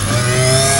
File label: HYDRAULIC; Northstar; The Wizard VOL-1